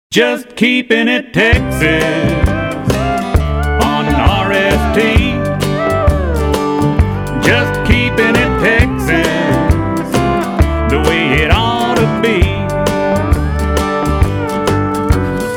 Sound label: Jingle